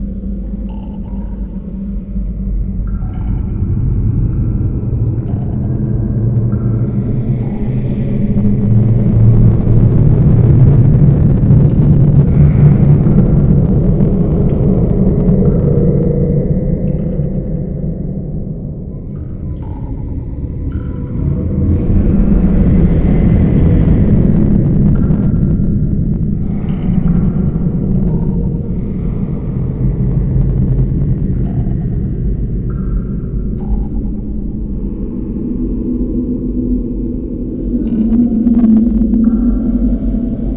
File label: cave ambience; gauntlet seven sorrows